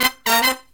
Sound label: Killer Brass Riffs, SOUL